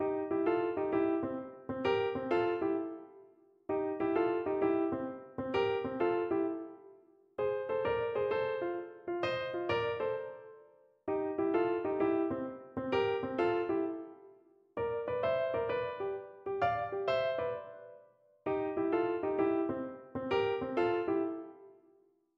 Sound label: Blues Piano Lick